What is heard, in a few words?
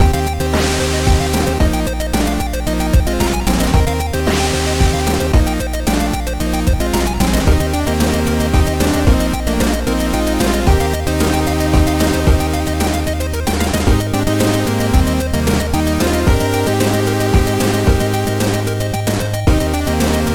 Evil Castle; skeleton boomerang 2017 pc gamerip